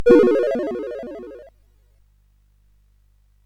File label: SFX; Narco Police